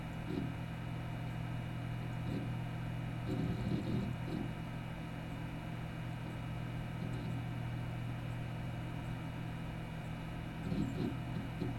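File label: long quiet fan noise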